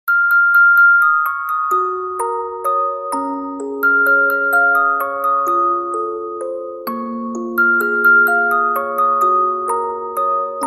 Music Box

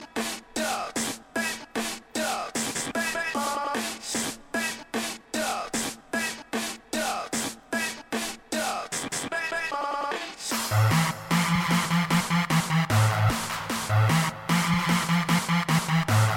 shuffle song

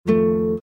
Simple Space Notes

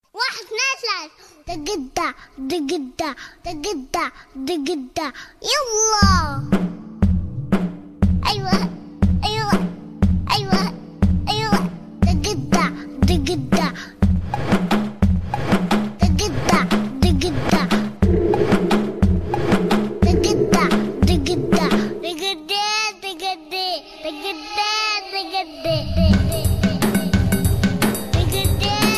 arabic tone; ringtones

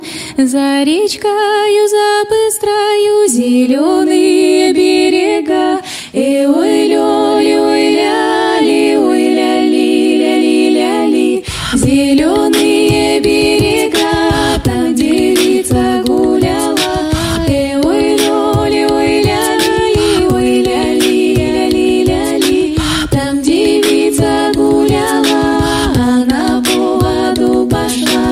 Folk Beat Russian

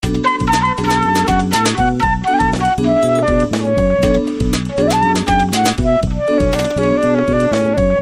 funky flute; ringtones; hip-hop

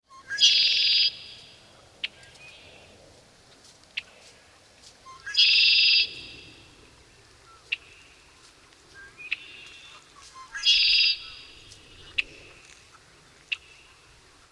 redwinged blackbird; animals

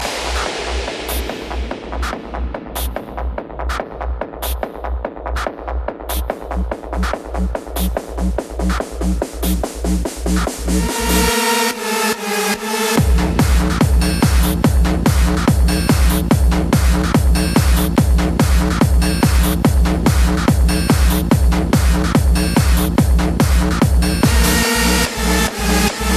Rings Audio

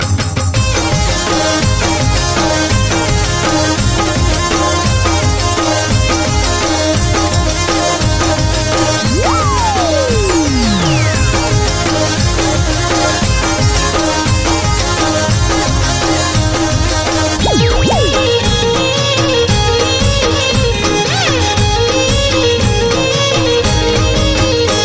gujarati music

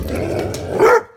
Growl Bark

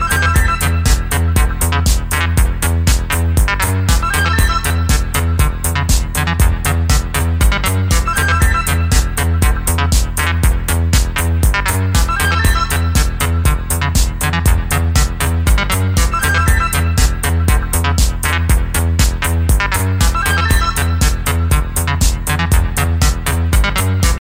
Passion 80s

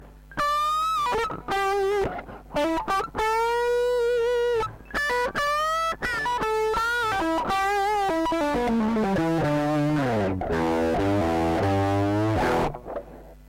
guitar solo, rock; ringtones

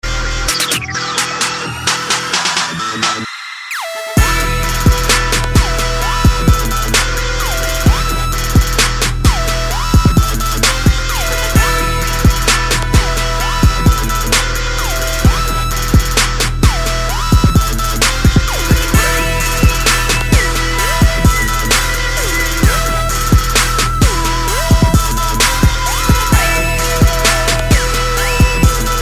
dirty south beat; hip hop

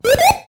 Squish; SMB3

